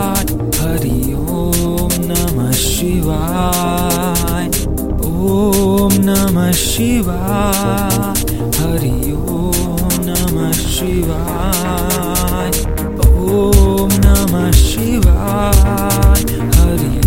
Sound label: Hari Om Namah Shivaya Om Namah Shivaya ringtone